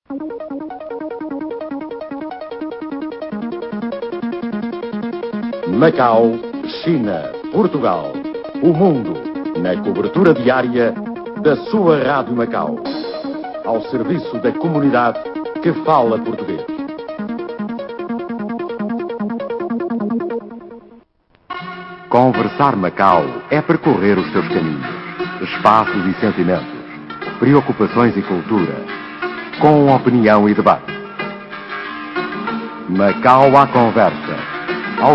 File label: interval signal, portuguese; radio macau